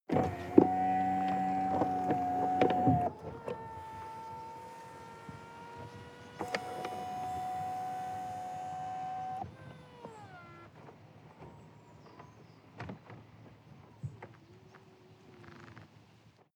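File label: sports car interior convertible top open; verkehr-transport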